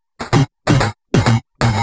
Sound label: Electro Loop 128BPM; Vengeance Samples